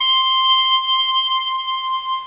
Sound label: muted trump C6; Star Trek JR Audio